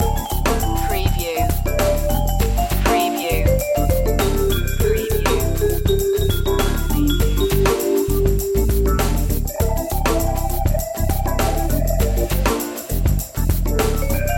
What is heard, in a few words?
easy life loop